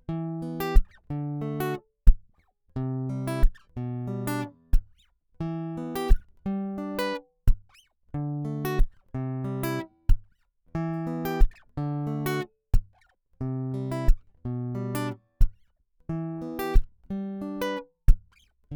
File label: Pickup Picking